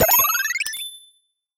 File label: Timer Clock Big Get